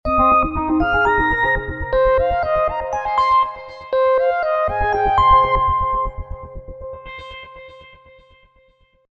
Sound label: Whistle